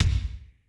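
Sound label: GATE KICK; Crypton - DrumBase5000; GATE KITS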